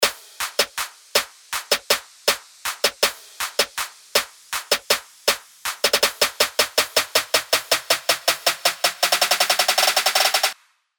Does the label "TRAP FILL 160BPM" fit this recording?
Yes